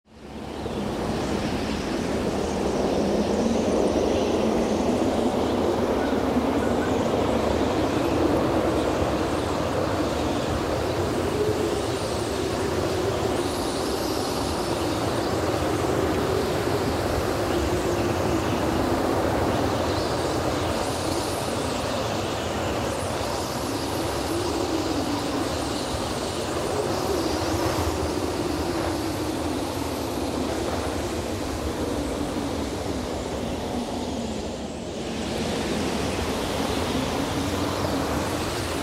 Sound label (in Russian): uragannyj veter